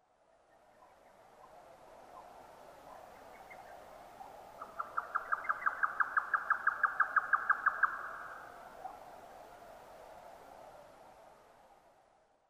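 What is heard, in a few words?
grey nightjar; yotaka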